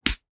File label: Slap; SoundsCrate